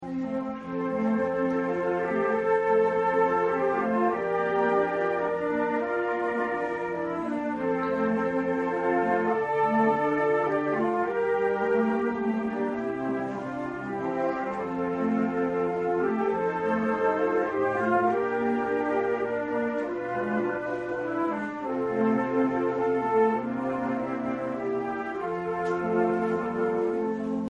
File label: concert, flute; Newyear concert 2017